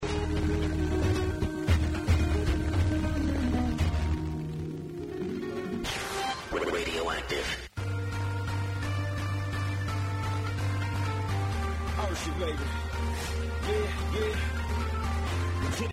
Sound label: radio, radioscanner